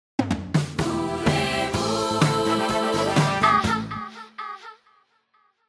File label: jingle